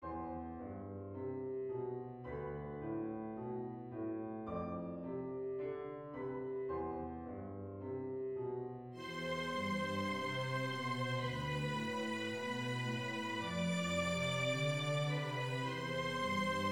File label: short simple orcestra